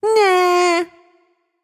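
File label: tongue, female voice; smite; voicepack; hera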